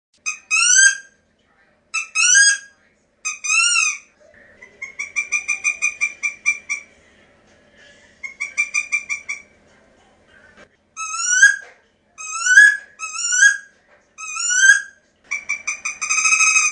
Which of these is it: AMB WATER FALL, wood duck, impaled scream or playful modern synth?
wood duck